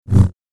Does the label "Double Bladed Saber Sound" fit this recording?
Yes